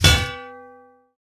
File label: Lamp Post Fall